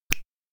finger snap; anemoi

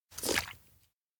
shaker cap on; anemoi

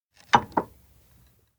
mug place coffee; anemoi

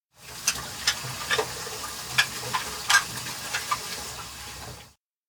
wash dishes; anemoi

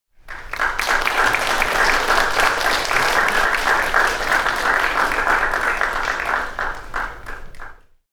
applause big; anemoi

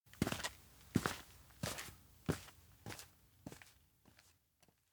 steps outdoor town leave; spica; anemoi

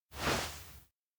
shoulder drop; anemoi

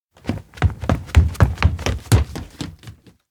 steps indoor school run; chiharu; anemoi パッケージ版 1.1

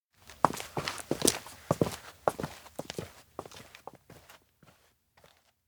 steps outdoor observatory pull walk; awayuki; anemoi

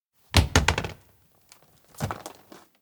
hall ball roll; anemoi

